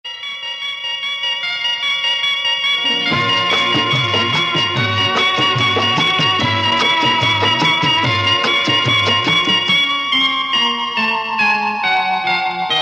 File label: Suspence